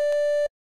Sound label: G Note